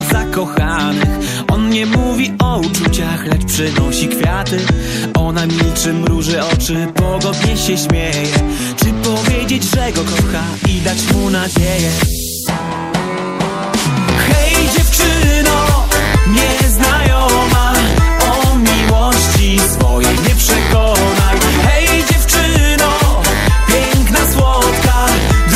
polish music

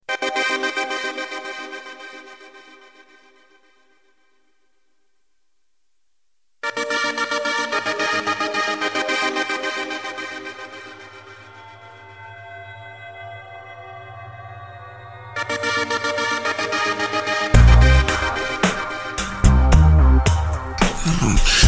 russian techno

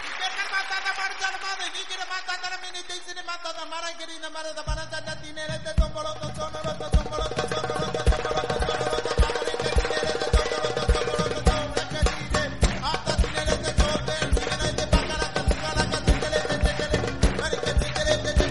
african style intro ringtone

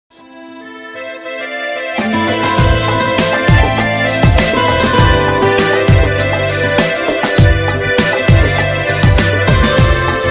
arabic music

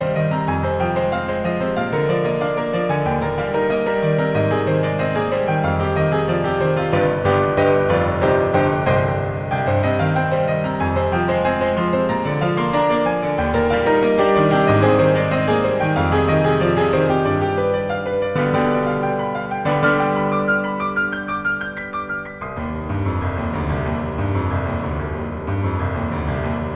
croatian piano; ringtone

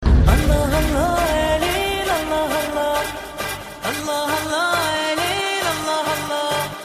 arabic be ready

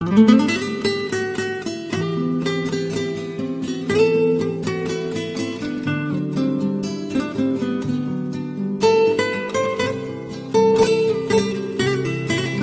kuwait guitar; ringtone

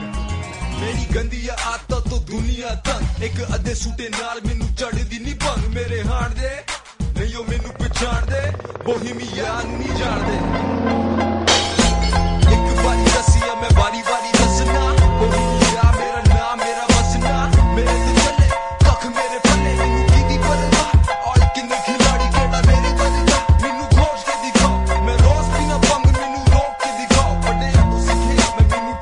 rap, ringtone

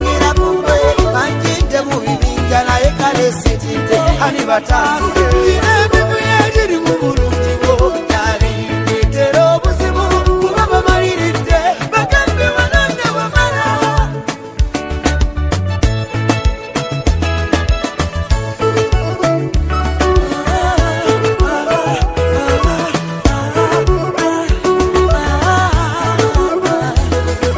ugandan music ringtone

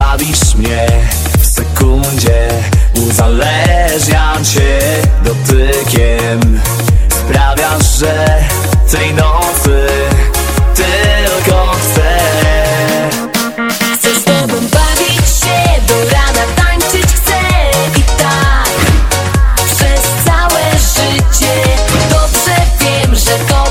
polish music

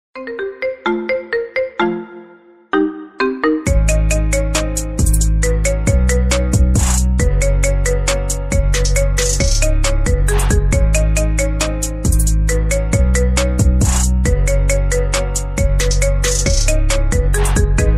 marimba remix

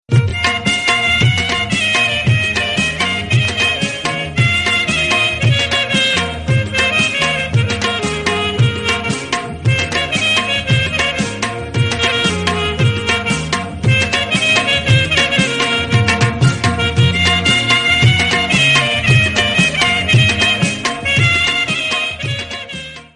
best pashto tone; ringtone

